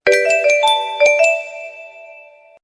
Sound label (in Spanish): alerta